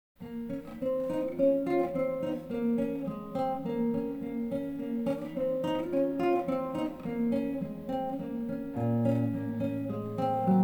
russian melody etude

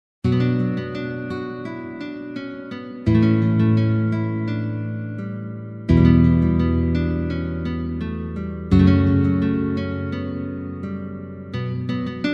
duet for classical guitar duet

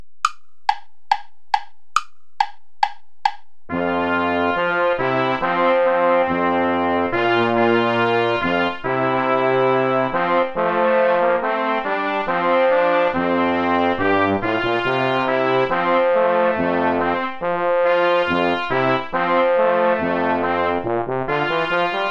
ballet play along without trumpet; praetorius michael

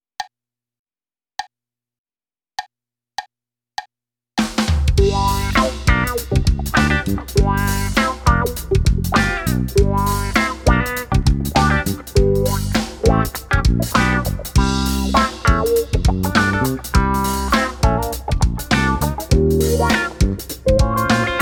funk groove; bergeron guy

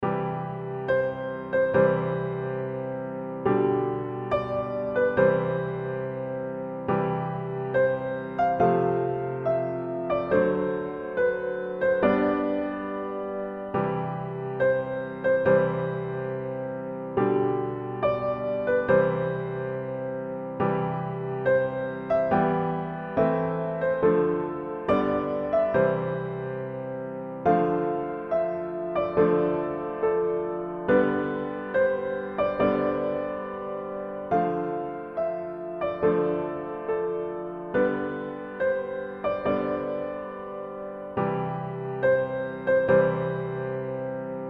bridal march very easy version; wagner wilhelm richard

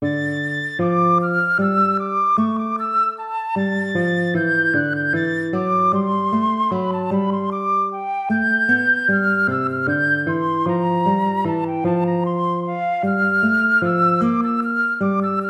air, hornpipe

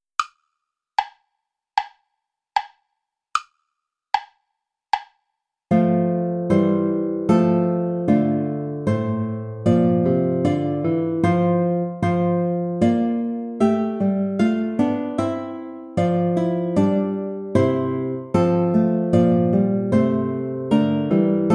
play along without guitar; bach johann sebastian; morning star how fair and bright

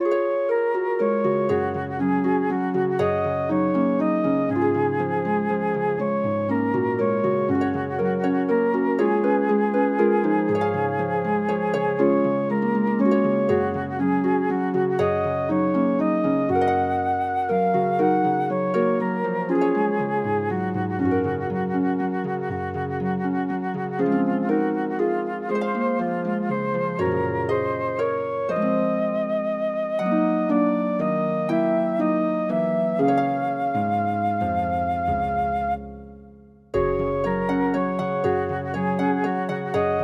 flute harp; the cliff's end

